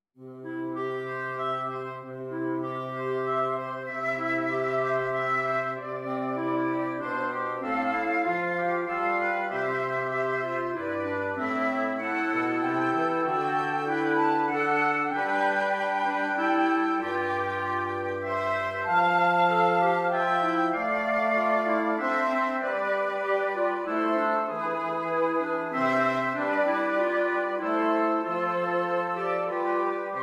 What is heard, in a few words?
woodwind quartet